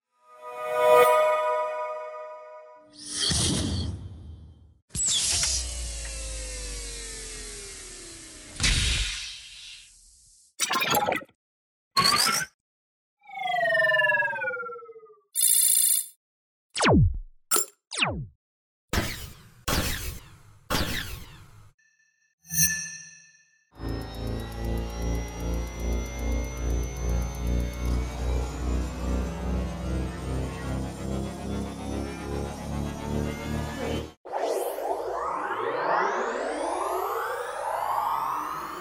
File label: Sci-fi